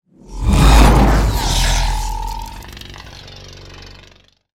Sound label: lightsaber; Kylo Ren